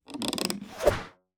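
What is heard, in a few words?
infantry archer, weapon; Battle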